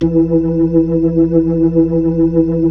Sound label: B-3